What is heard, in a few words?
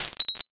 glass break